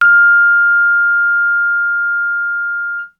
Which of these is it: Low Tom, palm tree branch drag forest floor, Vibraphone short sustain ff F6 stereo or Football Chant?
Vibraphone short sustain ff F6 stereo